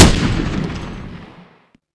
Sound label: 20mm stop, flakvierling38; emplacement